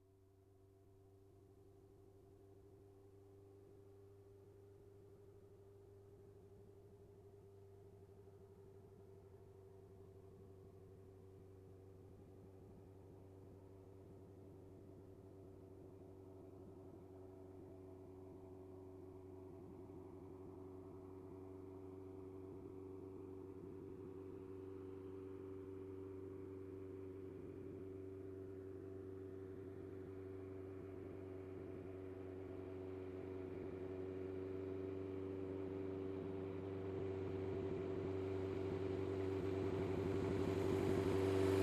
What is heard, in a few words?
Scooter passing by